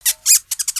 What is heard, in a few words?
Pet Rabbit Speak